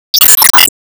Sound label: Enemy Teapot Saucer Attack Shoot; gamerip